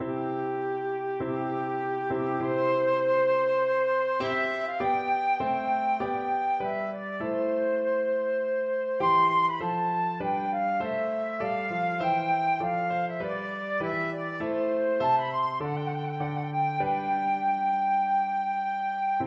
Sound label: flute